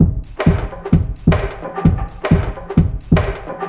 Dark Garage 130 bpm, drums; Loops; Ableton Live 12 Lite; Core Library

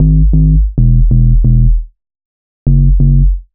Blocked G# 135 bpm, Synth; Loops; Tonal; Ableton Live 12 Lite; Core Library

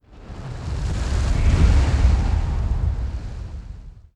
Gale Wind Start; Mountains; Journey

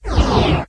satcam down; There; ThereClient

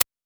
E-Perc Noise, Electronic Percussion; Drums; Ableton Live 12 Lite; Core Library

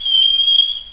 squeak; Game Maker 8

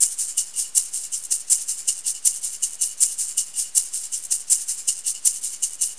Shaker Ambient 80 bpm; Loops; Drums; Percussion; Ableton Live 12 Lite; Core Library

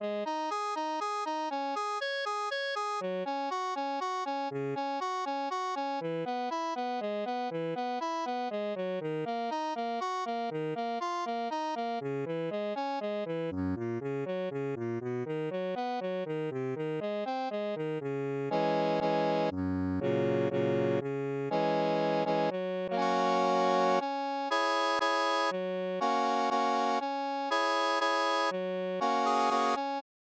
Backing; ACID Pro 7.0

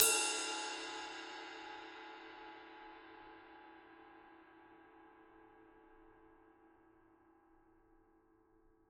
Ride Stick Mid; One Shots; Drums; Yester Years

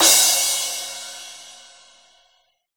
Crash Sing, Cymbal; One Shots; Drums; Ableton Live 12 Lite; Core Library

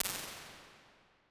Halls Warm Hall, impulse responses; Ableton Live 12 Lite

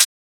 Hihat Closed

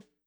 Snare Stick Hit Soft Dry Session, drums

